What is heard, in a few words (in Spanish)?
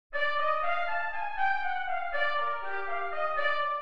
Min7 en C